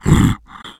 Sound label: become alert, male